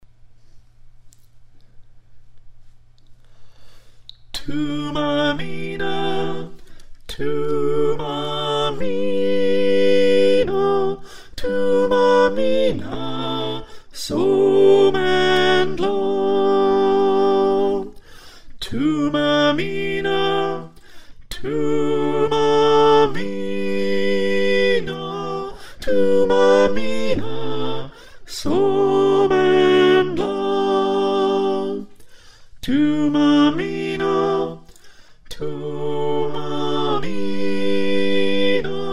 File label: Soprano